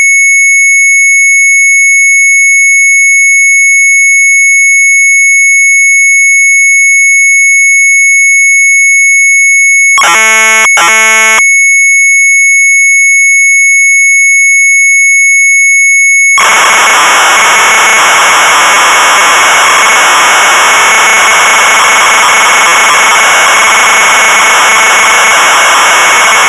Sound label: MZ800 Tape, sharp; REVERSE; retromelsarchive